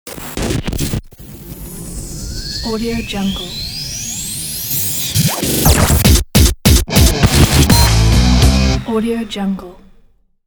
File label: radio jingle ident